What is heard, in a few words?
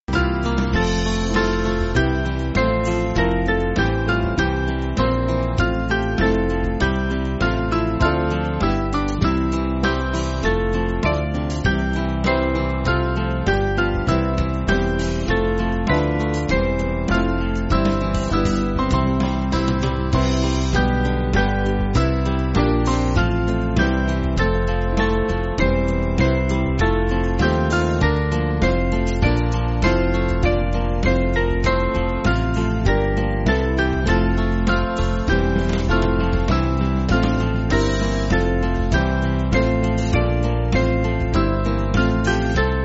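Band, Backing Files for Churches; O Master Let Me Walk; Kettering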